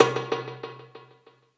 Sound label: percussion oneshot rebound; Pop; Drums; SD PASADENA Pop Sample Pack; Slate